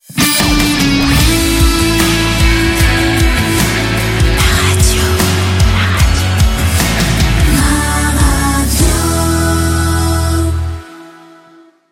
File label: ROCK